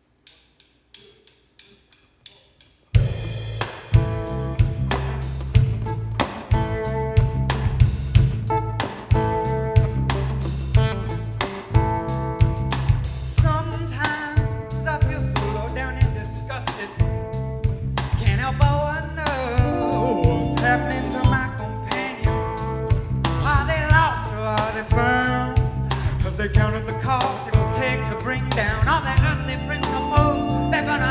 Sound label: Slow Train